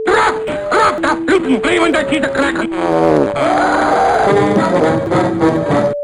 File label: fart; German